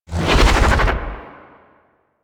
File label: Slash Dash; Warframe; Excalibur